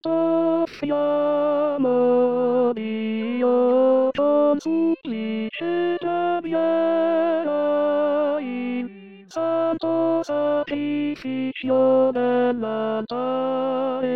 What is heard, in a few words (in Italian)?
Ti Offriamo Dio Tenori